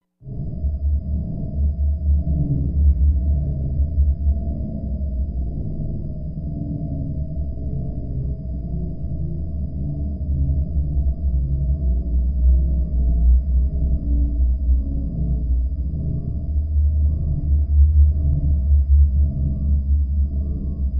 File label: Pulsing Lab Machine; ghosts